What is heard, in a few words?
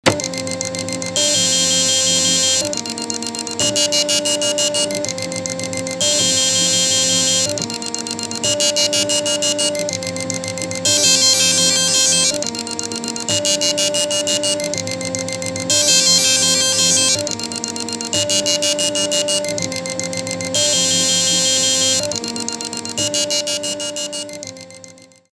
printer